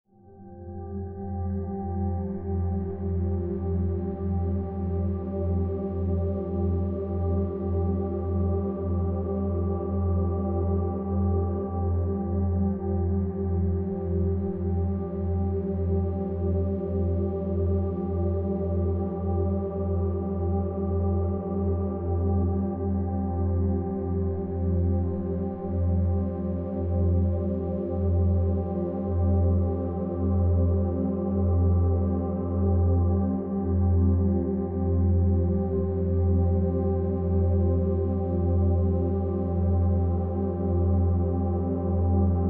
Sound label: Intergalactic Ambiance